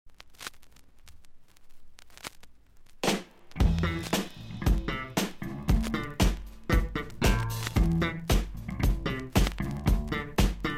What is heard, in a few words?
Funk